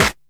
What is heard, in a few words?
Snare, MPC60, drum hits; Zero-G; Soundclash